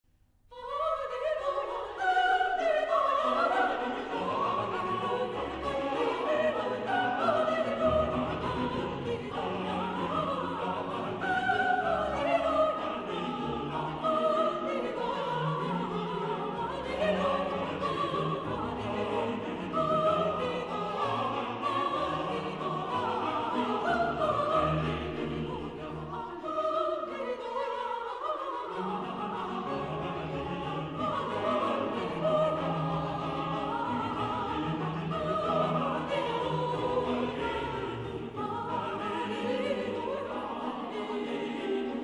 Halleluja; BACH; Motets

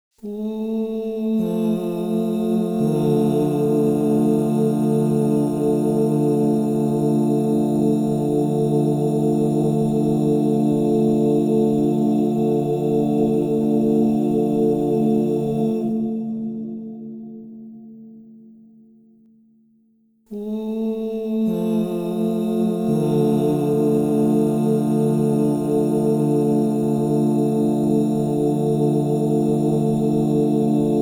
Re minor, crystal bowls; Yoga and sound

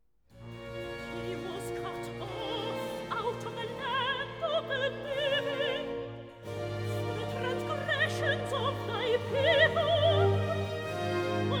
Recitative; He was cut off out of the Land; HANDEL; MESSIAH

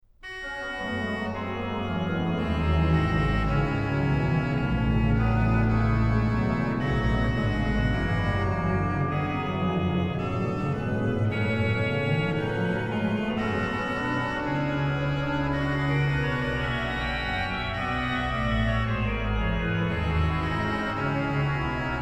ORGAN, Chorale Preludes; BWV0624; Hilf, Gott, dass mir's gelinge; BACH; BACH ORGAN WORKS

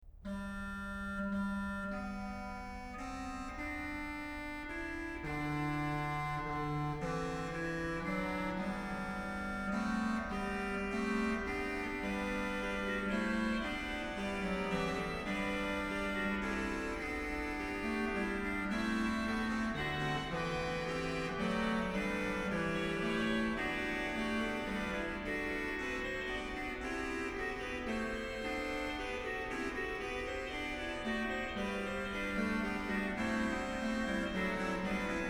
ORGAN, Chorale Preludes; BWV0724; Gott, durch deine Gьte; BACH; BACH ORGAN WORKS

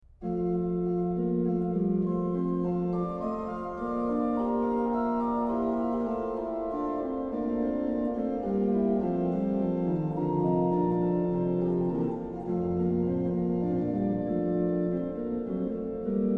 O Lamm Gottes unschuldig, organ; BACH; BACH ORGAN WORKS; Chorale Preludes

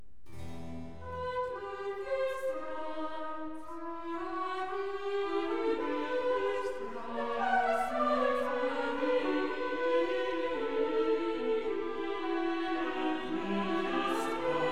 Chorus; And with His Stripes we are healed; HANDEL; MESSIAH

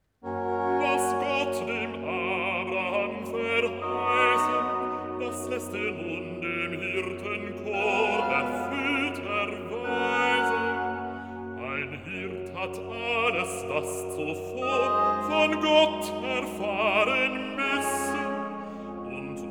Recitativo (Basso); Was Gott dem Abraham verheiЯen; BACH; Christmas Oratorio

